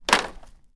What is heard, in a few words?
Dropping Wood